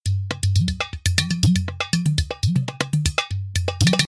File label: India 120